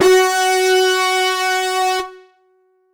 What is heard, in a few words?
Casio VZ 10m Lead C6